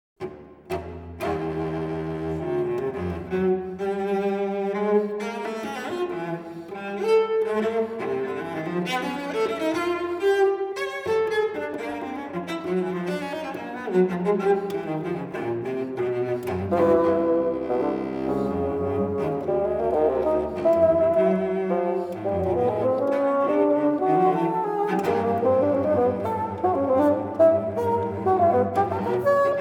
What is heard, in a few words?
Bassoon and Cello; Chamber Works